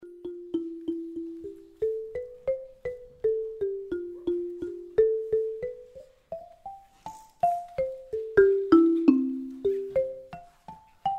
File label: xylophone